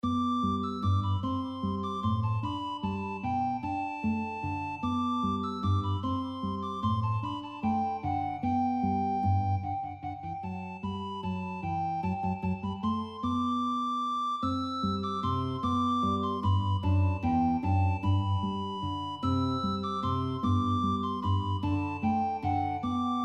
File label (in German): Duostucke Sopranblockflote Gitarre